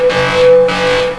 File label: ALARM